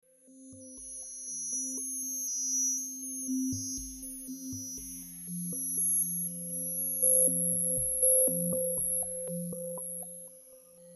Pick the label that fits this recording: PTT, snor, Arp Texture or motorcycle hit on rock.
Arp Texture